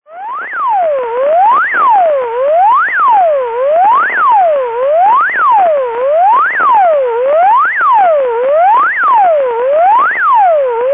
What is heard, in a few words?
Siren Noise